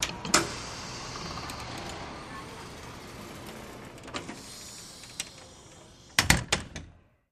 Hospital Door Close, pneumatic

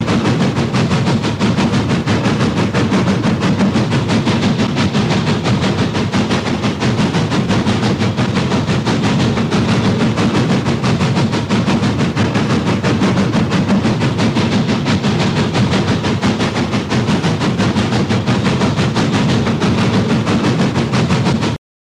Steam Loco Stdy Mo

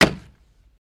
Axe Chop Wood Impact

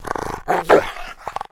Rottweiler Growl

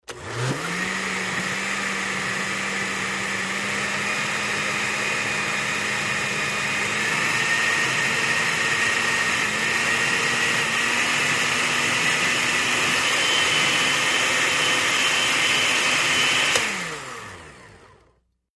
Blender Increase Spe; appliances

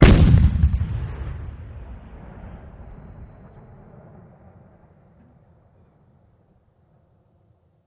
Cannon Blast Single; shots

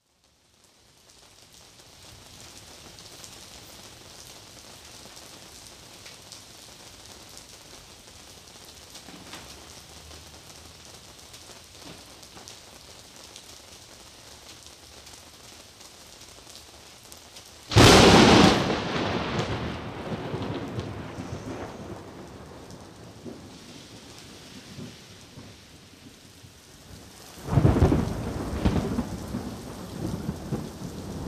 Rain W Heavy Thunder; car alarms

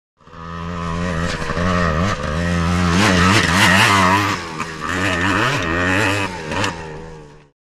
By Scrambles Motorbi; trials bike

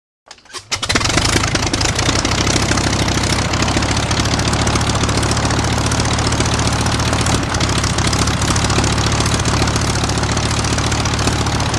Prop Plane Start Idle, vintage

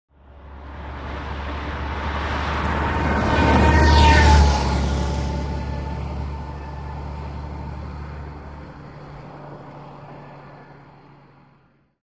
Bus By Coach Fast Driv

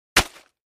Foliage Impact Hard and Crunchy; hits & impacts

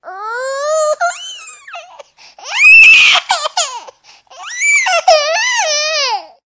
Baby: Crying And Screaming.

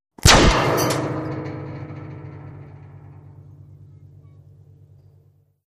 Bullet with Whir Impa, metal

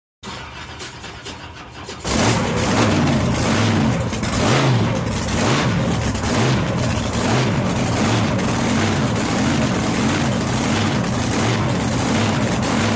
Drag Boat Start idle

